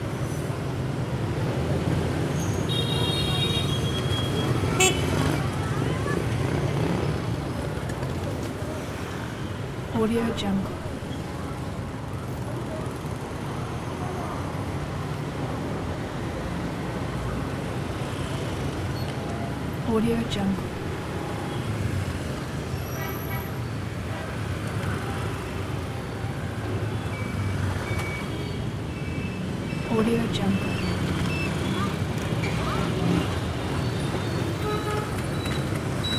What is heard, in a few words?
saigon busy traffic ambiance loop; blazznet